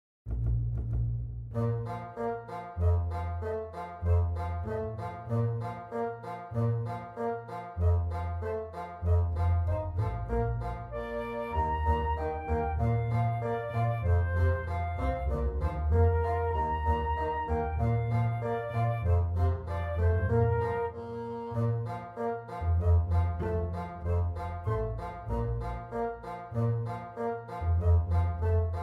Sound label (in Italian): clarinetto live; aleo emanuele